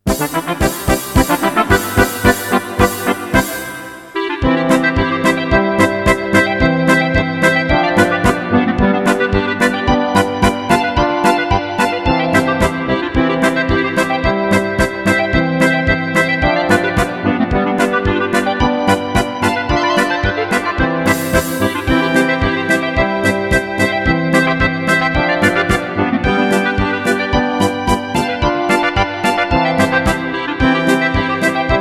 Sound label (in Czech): Klarinet